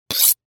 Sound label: Weapon Knife Sharpen On Metal